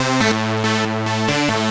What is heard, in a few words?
Lead 140; Audacity; loops